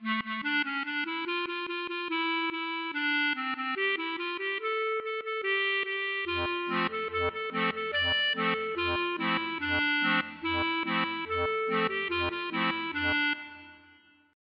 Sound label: klezmer